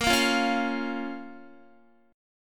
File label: A sharp Minor A sharp down Guitar; A#m; strummed